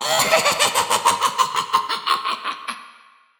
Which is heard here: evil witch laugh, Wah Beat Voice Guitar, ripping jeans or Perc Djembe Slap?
evil witch laugh